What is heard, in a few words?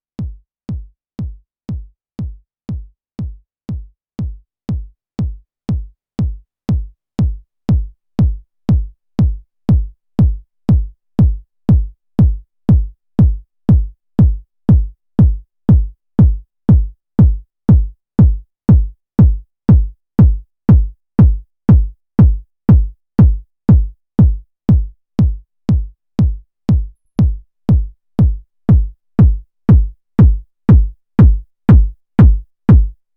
909 3 Modi Input Variation